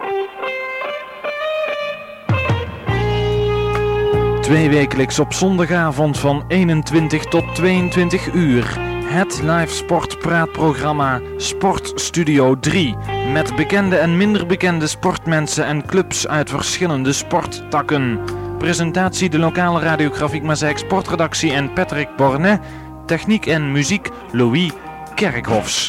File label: Jingle; Sportstudio 3